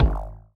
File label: ball bounce wave; CrossCode; battle